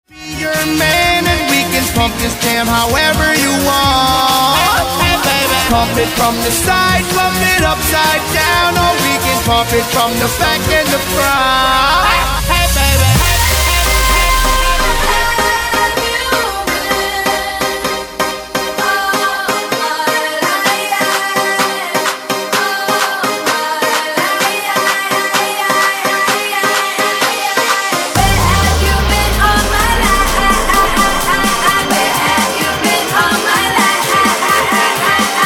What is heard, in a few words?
Mashup EDM Festival